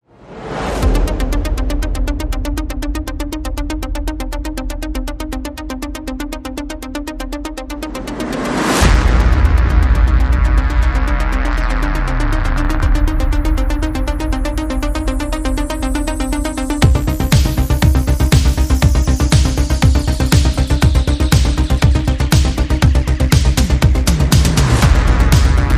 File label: no lead synth